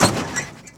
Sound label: Crash Small; Test Drive Off-Road 3